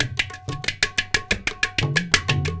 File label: India, Heart of Asia